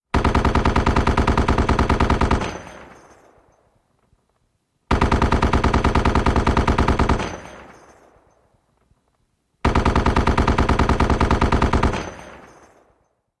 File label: .50 Caliber: Long, Fast Bursts With Shells Dropping